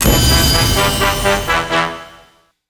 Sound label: level two achieved